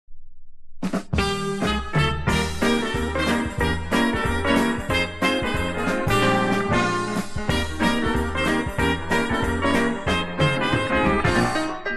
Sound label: Honky Tonk Bands